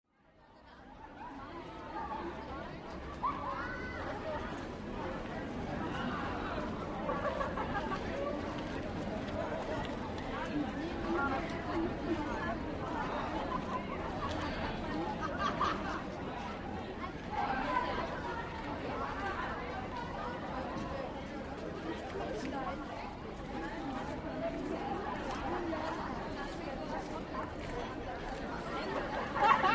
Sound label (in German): Geraeusche Schulhof